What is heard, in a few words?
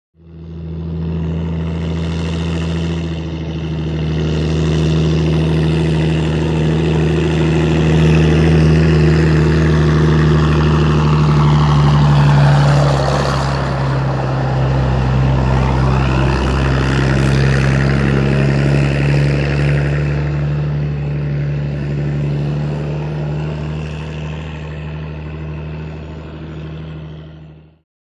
Excavator; Excavator ( Jcb ) Up And Past; earthmover